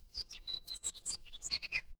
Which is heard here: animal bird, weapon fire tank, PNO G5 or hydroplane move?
animal bird